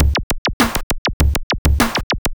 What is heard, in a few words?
basic linn, drum loops; hh drum loops